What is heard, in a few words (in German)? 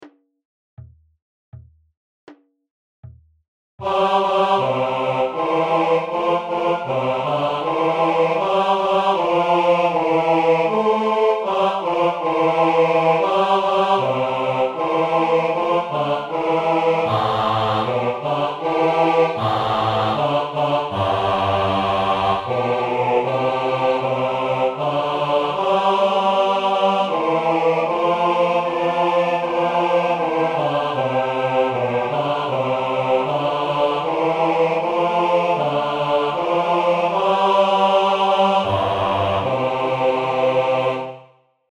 Bass, Raetoromanische Volkslieder; Domanda